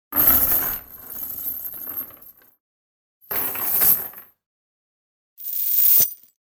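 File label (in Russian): chain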